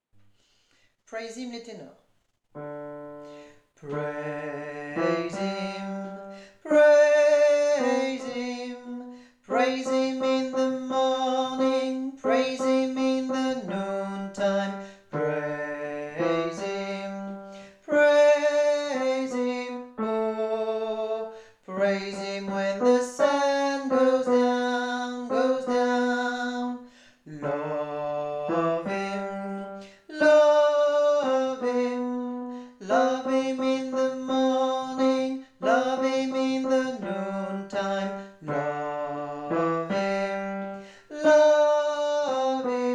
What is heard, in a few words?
tenor